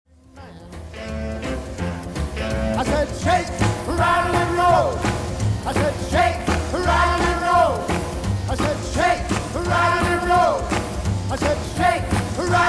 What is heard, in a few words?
R&R